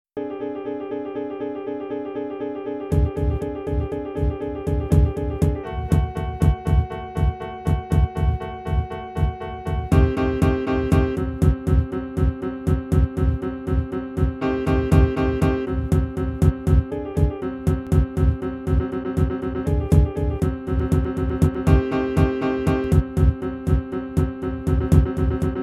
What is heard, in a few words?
classica